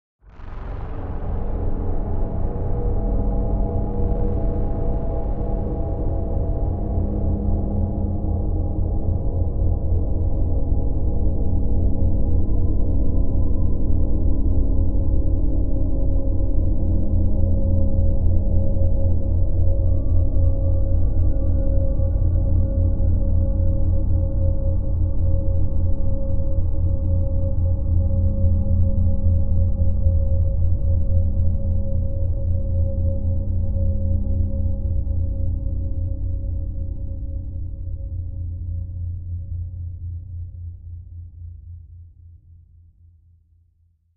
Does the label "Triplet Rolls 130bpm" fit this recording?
No